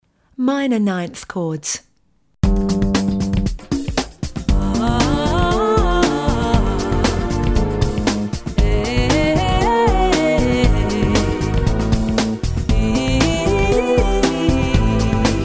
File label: minor 9th chords